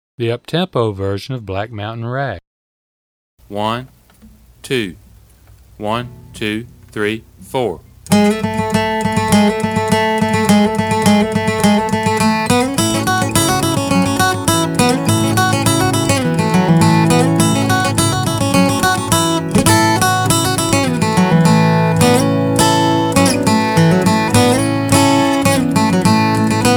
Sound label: Fast; Black Mountain Rag